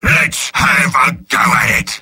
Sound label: battle cry; sniper; mvm